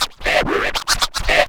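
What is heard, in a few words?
SCRATCH FILLS; AMG Megabass REMIX! VOL-1 & 2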